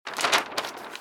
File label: Map Pickup; Doodle Jump; 3DS, Android, Arcade, DS, iOS, Mobile, Xbox 360